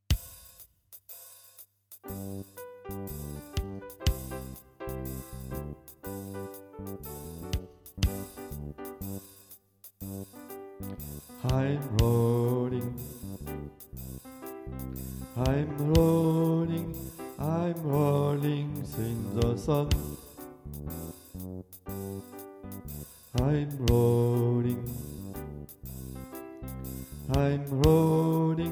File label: alto